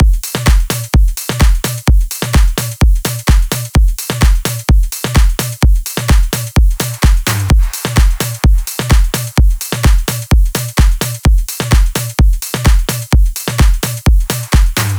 drum loop dry; Drums dry; JMG Sound Mirror

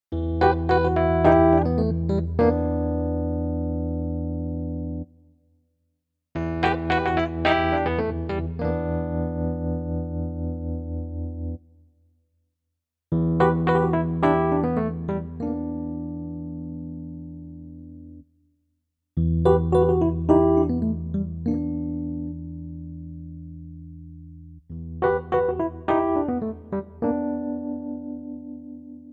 grand piano; v3 sound grand piano xxl

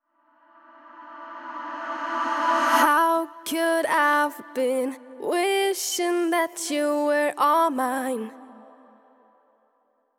reverse reverb

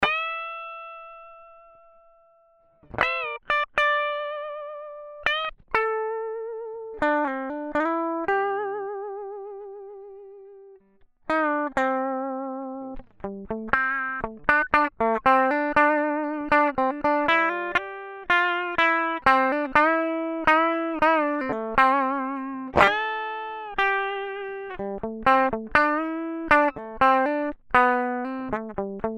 solo reload DI output

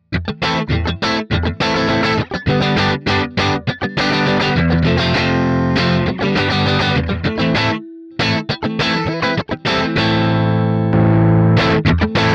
MV50 Rock dirty boost; vox mv50 rock test

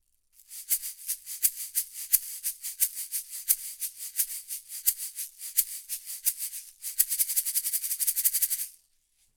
manley reference cardioid shaker; manley reference cardioid test

